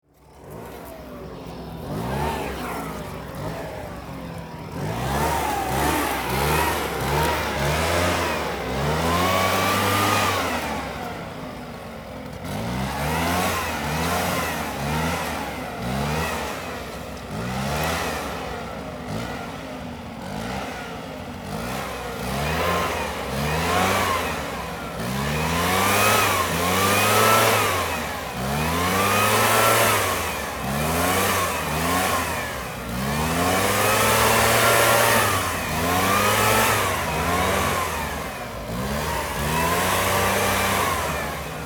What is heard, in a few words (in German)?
Laubgeblaese